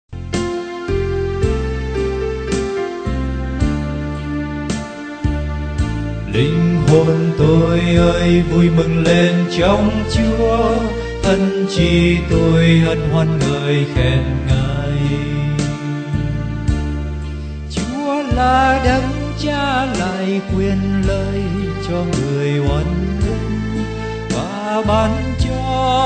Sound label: BASS